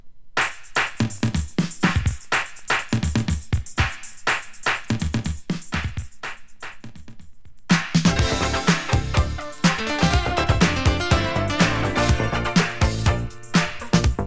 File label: music; Prelude (us); N.Y.ブギー/80sファンク